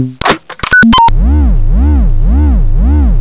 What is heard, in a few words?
turn on; Zoemtonen hisses pingen woesjes tikjes